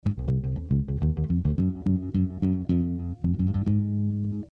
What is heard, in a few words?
Bass